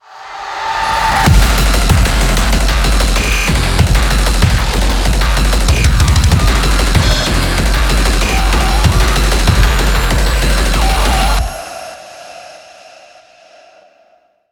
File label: Main Heavy; Doom Hunter Base - Main Heavy 08